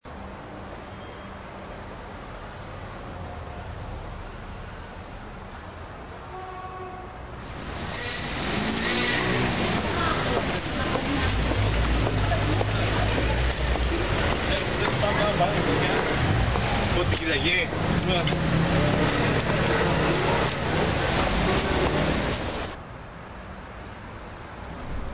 calm city

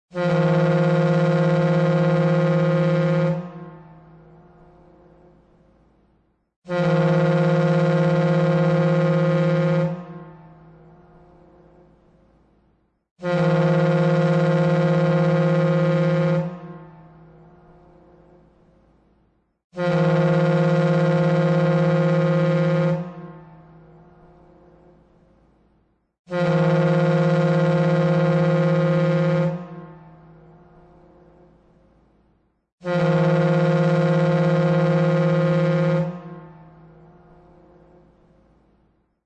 boat horn continious

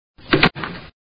door shut, household sounds

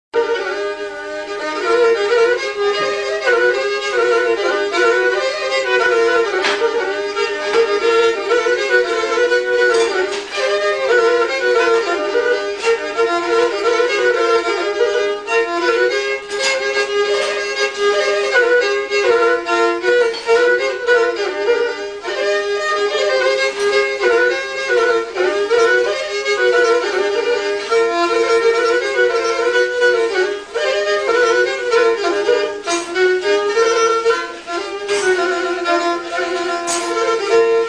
lyra

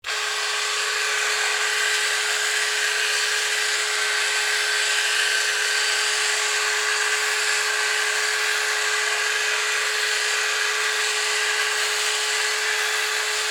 electric shaver, household sounds

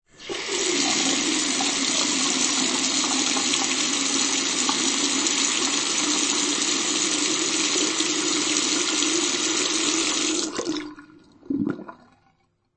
bathroom faucet, household sounds

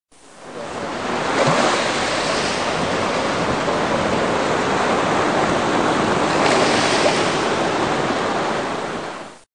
ocean edge

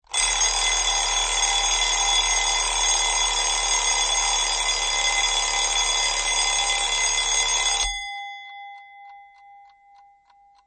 alarm clock, household sounds

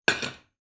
pot onto stove

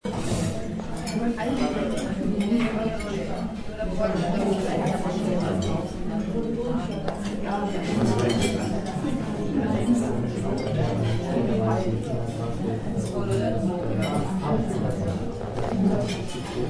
Restaurant Ambiance

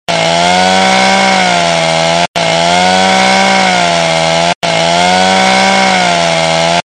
sawcut

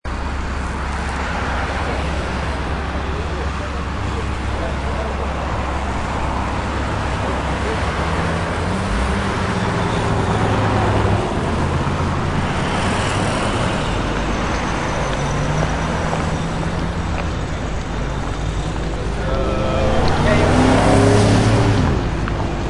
city traffic

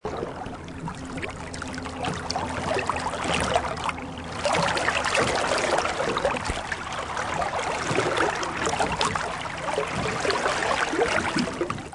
Rowing A Boat

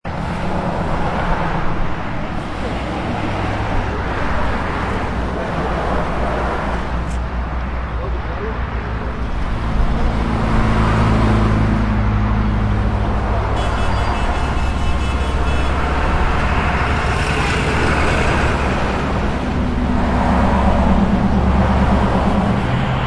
city traffic

